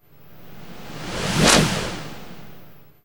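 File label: FX left, attacks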